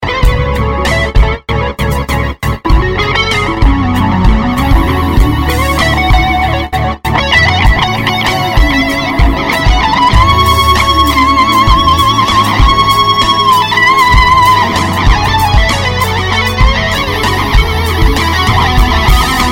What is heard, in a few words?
Rockstar Gutar